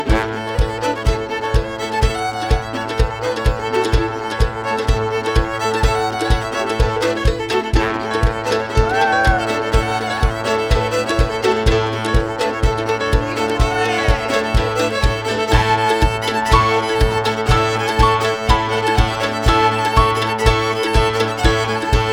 trombone

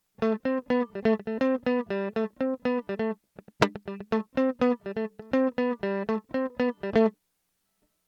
mandolin comparison electric noodles tb

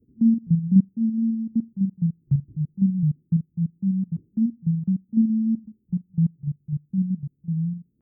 bass whistle down three octaves

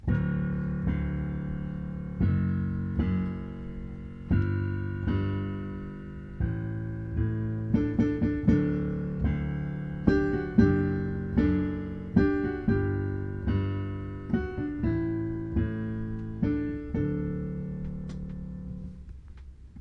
rocking octaves slow

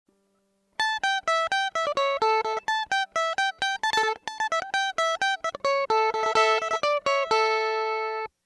mandolin comparison electric srm

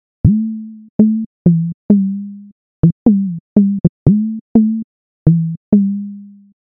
whistle example treble fade

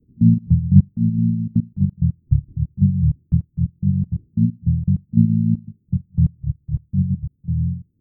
bass whistle

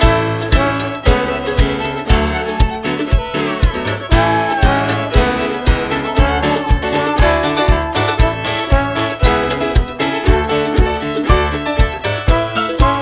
trombone c

